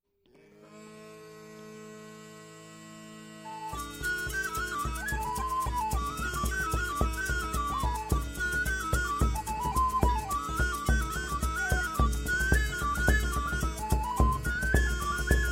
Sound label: Celtic Bagpipes Scottish Wedding Music; Braveheart